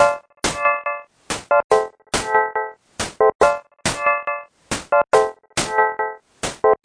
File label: 140 two chords vocoded bands synth; SYNTH AND ELECTRONIC LOOPS